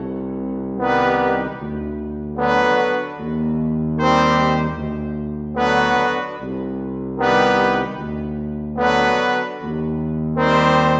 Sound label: Brass, Cinematic; Sonic Foundry (Sony Creative Software); Orchestral Series VOL-3 - Cinematic